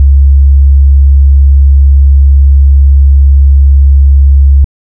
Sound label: Sine